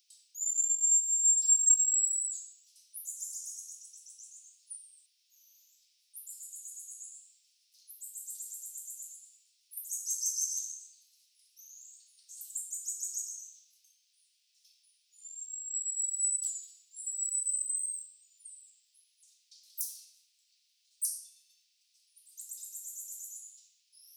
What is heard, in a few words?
Marmosets in conversation; David Omers Lab